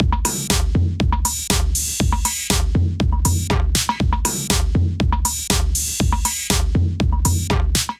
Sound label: Kit, Drums; Krillion; Ableton; Factory Packs; Punch and Tilt